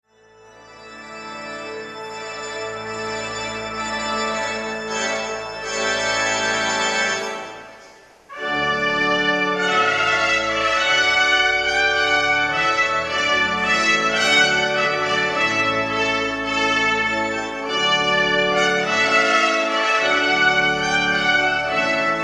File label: Trumpet Voluntary